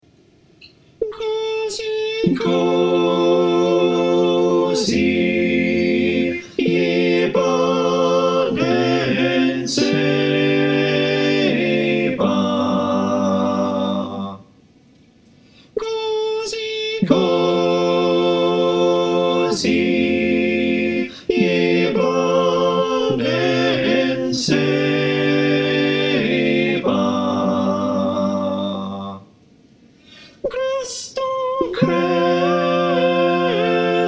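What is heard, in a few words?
soprano alto tenor bass, choir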